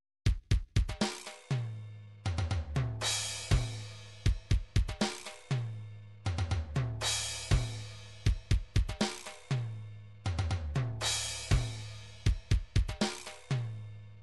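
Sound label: drums pattern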